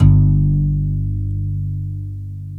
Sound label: Guitarron G0; Planet Earth; Digital Sound Factory Planet Earth